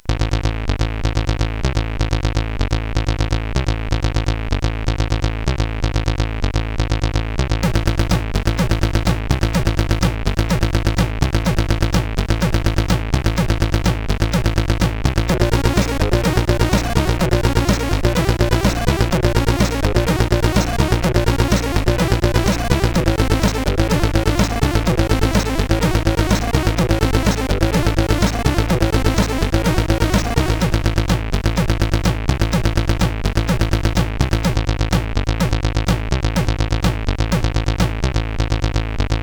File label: Introtune sid CSG8580R5; hvsc